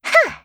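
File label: attack, Voice Line, Korean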